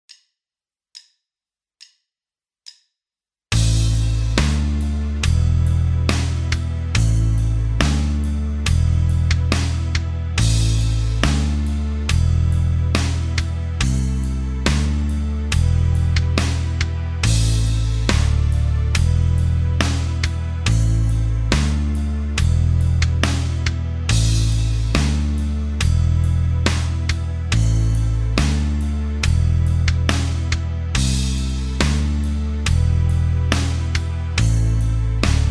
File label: In The Woodshed backing, Guitar Techniques; Guitar Techniques 2017 Issue 275 November